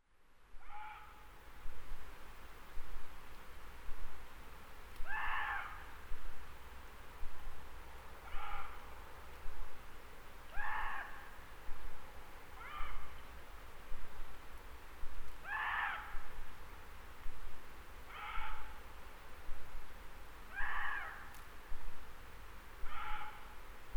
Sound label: nightingale muntjacs; nightingale diary 2012